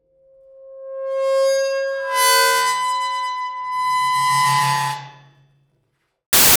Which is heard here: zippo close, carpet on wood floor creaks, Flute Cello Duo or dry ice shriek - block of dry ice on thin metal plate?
dry ice shriek - block of dry ice on thin metal plate